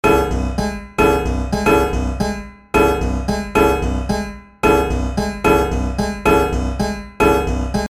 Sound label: loop mouvement 111bpm